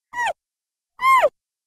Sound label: Baby Panda Sound